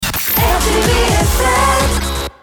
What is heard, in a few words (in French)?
Jingle Chanté; RTVFM; chateaurenad